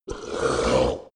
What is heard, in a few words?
monster hit gurgle low; comedy-cartoon; soundpool